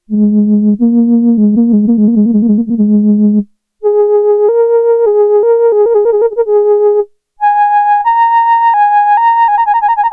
FLUTE, Roland SH-101; Factory Patches

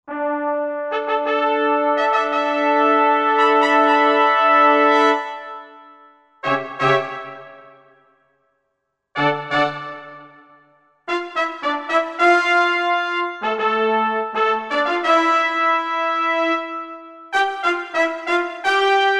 Trumpet Ens, Roland SR-JV80-16 Orchestral II

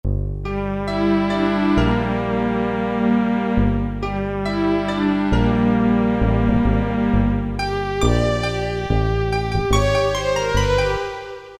Bass-Piano Strings; Roland PN-D50-03

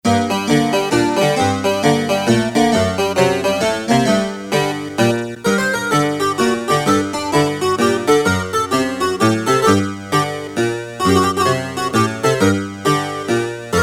Harpsichord, Casio CT-370; Tones

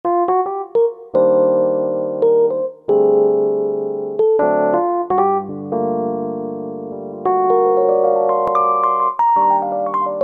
Soft E.P., Korg T3

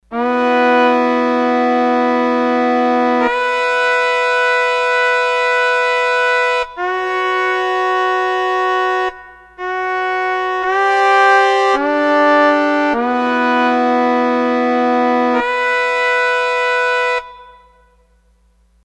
Sho, Roland D-10; Factory Patches

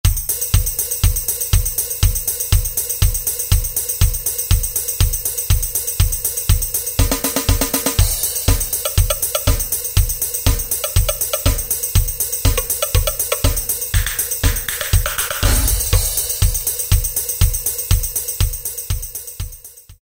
Alesis SR-16 User Patterns; Delight

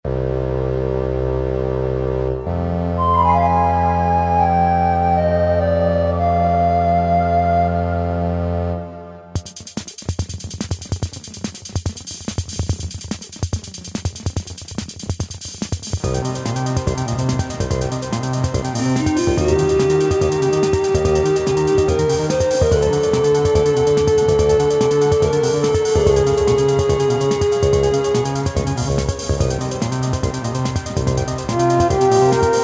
cosmic tone + Quadraverb2, Casio MT-68; Quadraverb2 ''Crystal Delays''

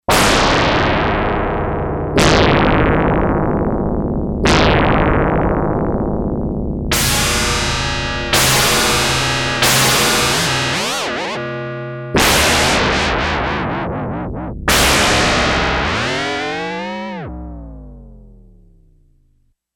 Earthquake, Yamaha DX11; Factory Preset Voices

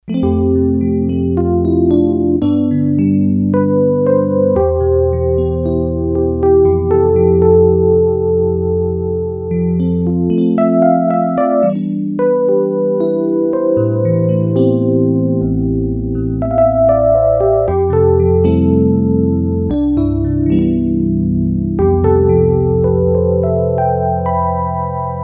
El. Piano, Korg DS-8; Factory Patches

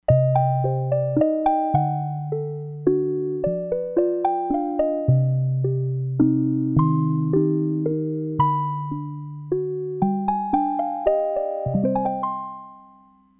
TOY SISTRON, Crumar Bit 99; Factory Programs

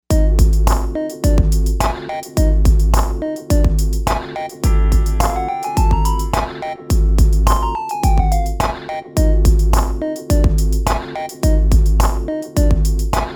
ELECTRO, AKAI XR20; Factory patterns

